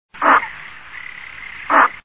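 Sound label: spoonbill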